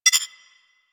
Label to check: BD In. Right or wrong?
Wrong